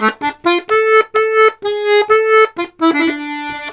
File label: Accordions; USB Soundscan vol.40; AKAI